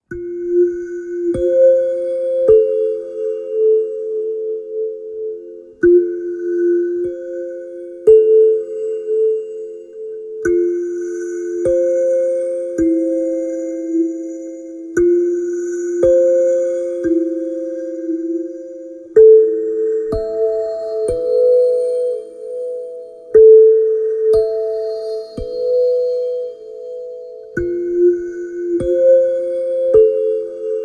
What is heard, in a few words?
Sounds of my Hands